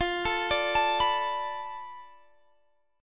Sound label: B-Minor 7th F; arpeggiated